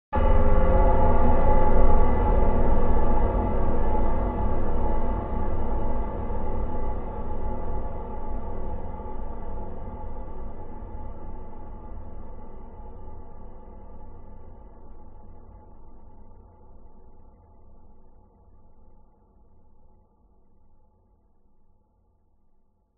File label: horror hit stab piano dark deep low